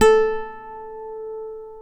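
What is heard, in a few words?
A3 FLAMENCO, FLAMENCO guitar; WorldSounds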